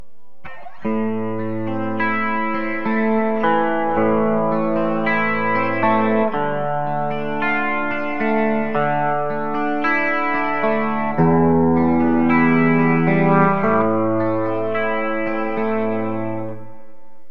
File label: bridge clean; Burny